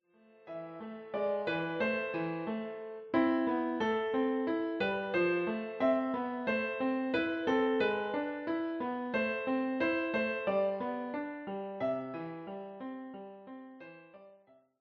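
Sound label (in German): Maria durch ein Dornwald ging, Klavier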